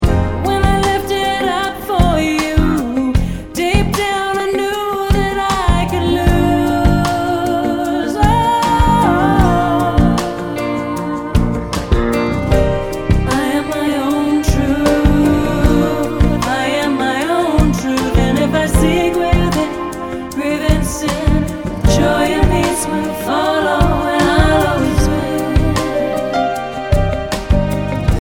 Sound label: Singing